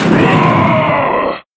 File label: launch; incredible hulk chitauri takedown